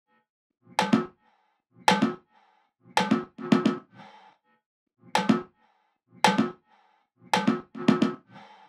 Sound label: Drum Loop 110 BPM Percussion; Experimental Drum Loops; Loop Stems; Cymatics; Mystery Pack Vol 4; Weekend Drum Loop